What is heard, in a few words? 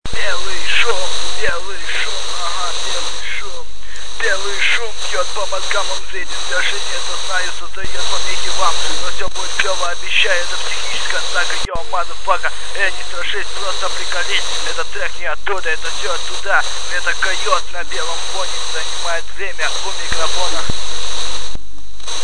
white noise